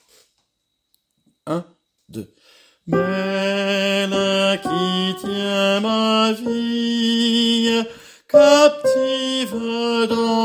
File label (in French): belle qui tient ma vie soprani